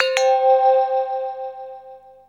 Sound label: amb, bell atmos; Best Service ProSamples vol.36; Chillout